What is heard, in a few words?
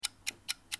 clock